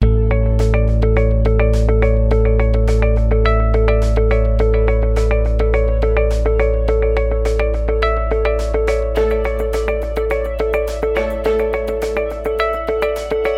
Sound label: Marimba Joy; walk through video music